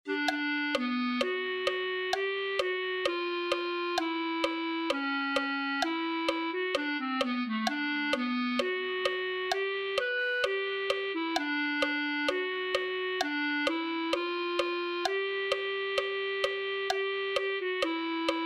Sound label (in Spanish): clarinete1